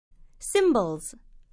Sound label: cymbals